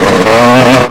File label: throttle, YAMAHA